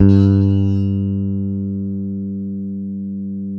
bass, sustain; Sampleheads; Will Lee Bass Library

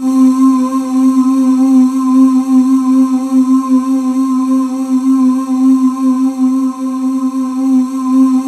C4, Synth Leads

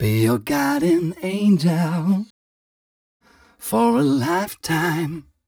m 129 mel e, Vocals

